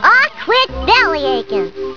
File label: belly ake